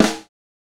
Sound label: snare samples, Rock